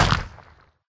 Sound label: stone crush; Geschicklichkeit; Bricks Of Egypt